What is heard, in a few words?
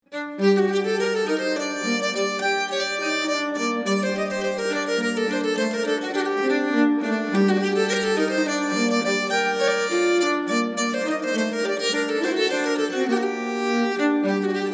Polska, nyckelharpa; efter Lackstrom